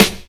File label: clear acoustic snare g sharp key